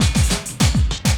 loop, 200 BPM; Ueberschall; Drum & Bass Resonance

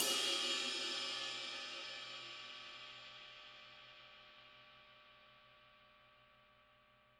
Jazz Ride, Close; Sonic Foundry (Sony Creative Software); Drums from the Big Room The Mixes; Demo Projects

